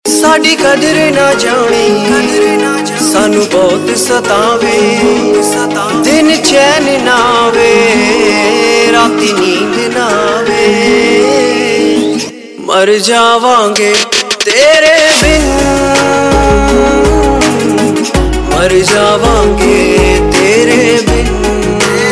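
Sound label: Ringtone, Punjabi Pop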